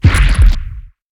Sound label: Dot Damage; Inotia 3